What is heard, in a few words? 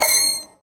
door ice open; minetest; minetest mtg plus